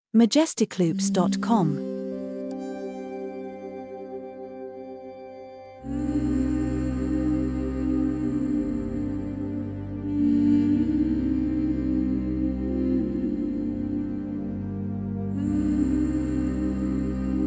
Tranquil Waves

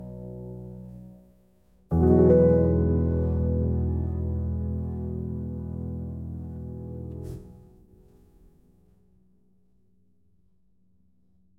Logo Piano

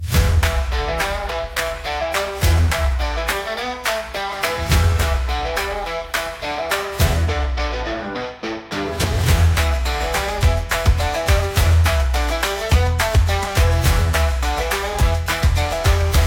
Stomp

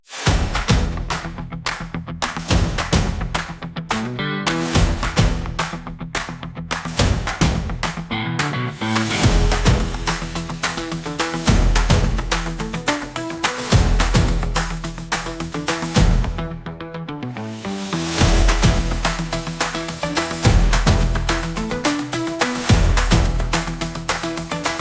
Stomp

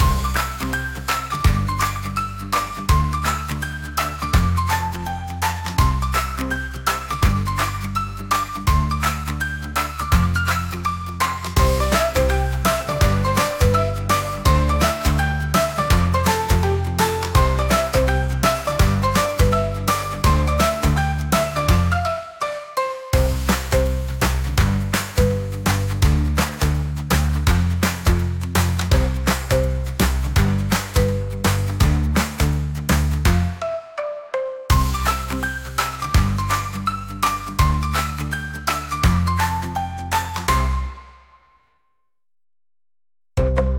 Stomp whistle

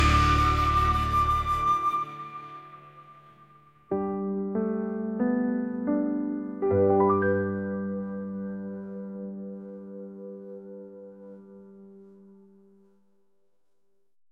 Piano Logo

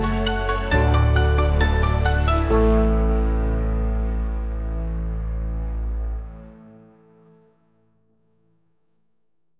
Logo Piano

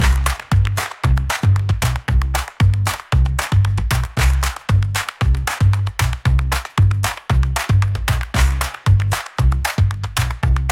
Stomp fastclap

